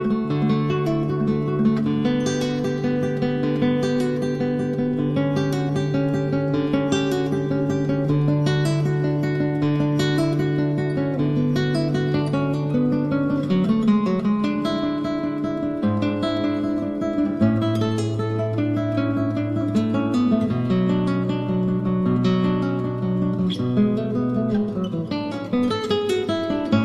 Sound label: Cello Suite No. 1- Prelude